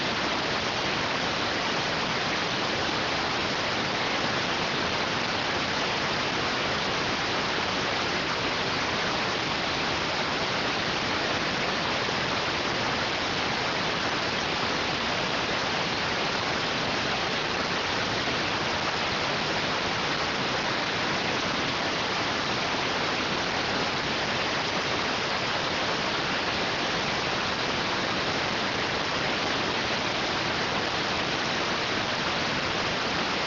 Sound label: rtty rx